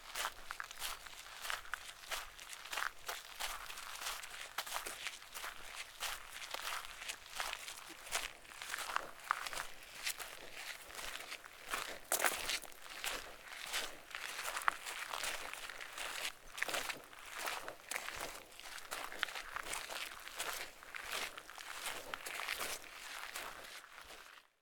Footsteps On Wet Footpath

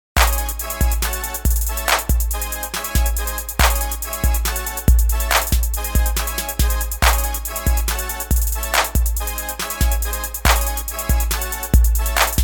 Rap Beat Loop

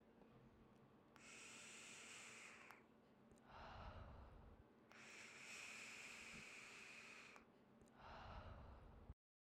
Gas Mask Breathing

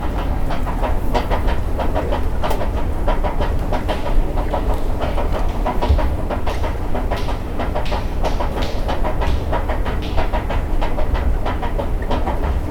Escalator

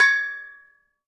gong percussion

